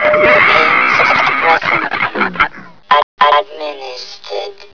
attack; sven; zombie hev

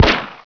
glock fire; weapons

